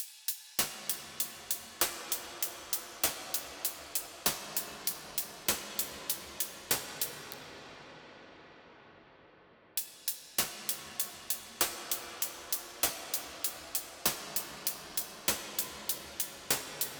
Eventide Blackhole

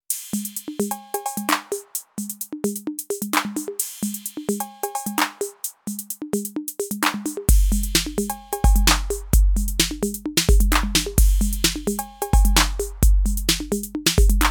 Roland TR 08 Beat

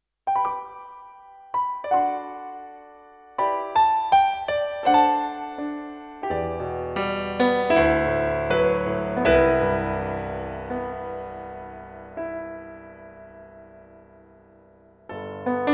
Roland EA7 Stage Grand

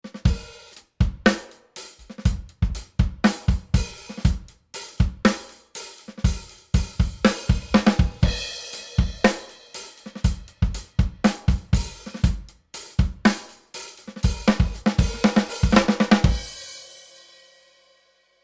Drums Saturation; Rockruepel Comp.Two V2

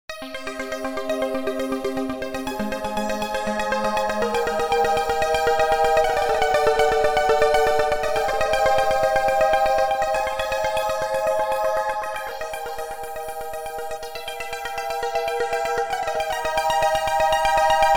Square Arpeggio Sequenz

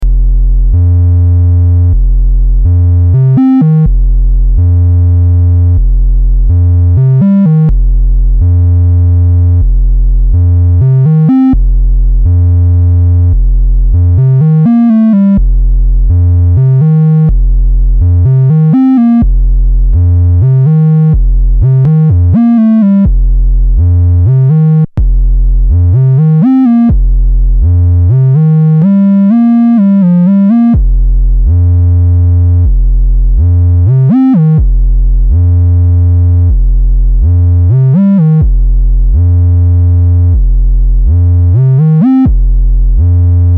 Roland SE-02 Osc 1 Triangle Pure

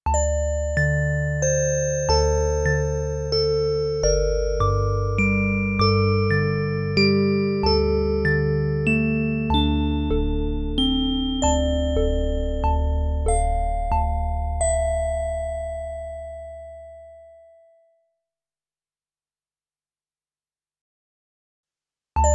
Quantec QRS

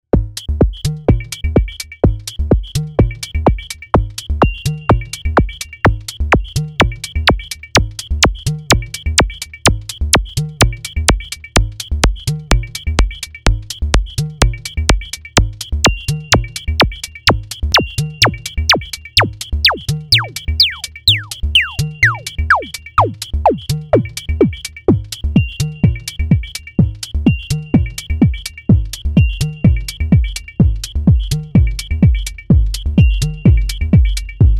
Korg Volca Drum Kick Groove

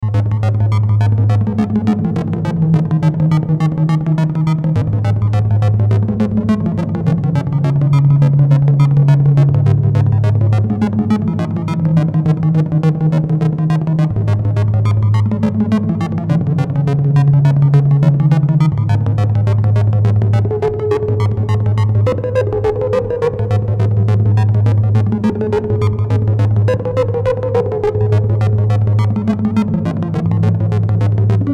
Buchla Easel V Little Bit Sequence; Arturia

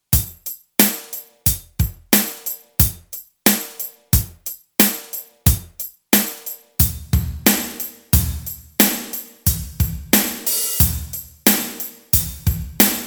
Lexicon Alex Tiled Room

